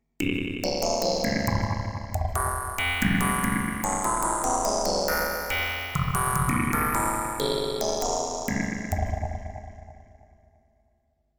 Loop Bidirection

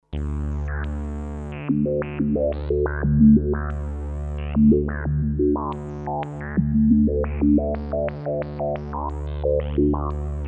Resonant Filter; WAVeBOY Industries

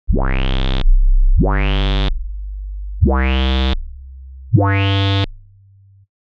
Reso Arturia Modular V3; SIGNAL FLOW PART 2 EDIT

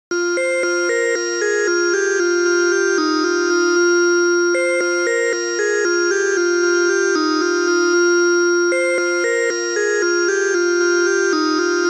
Monark Poly 3 Tri dreamer cleaner

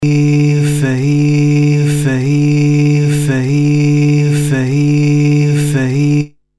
Cross Fade Loop

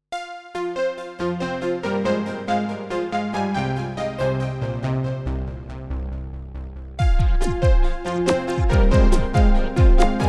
electro age draft 90ies done with ASR 10

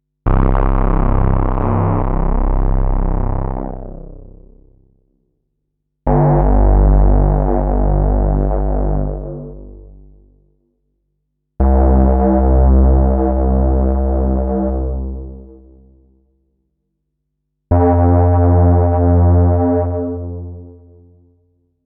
HOLLOW FUZZ Moog Model 15 iOS

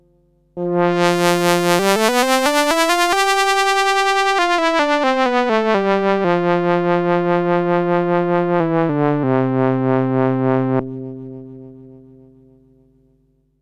WHEEL POLY Moog Model 15 iOS

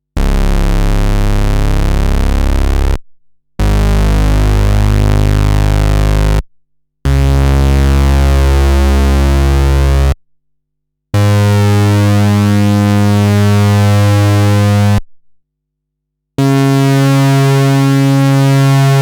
MOD BASS Moog Model 15 iOS

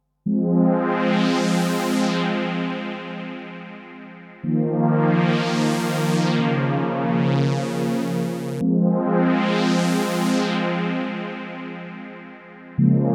AN1x Poly swell

